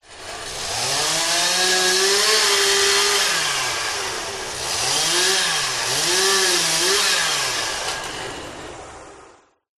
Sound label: Chain Saw